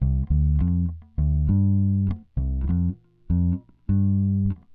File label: Jazz bass, Rock Bass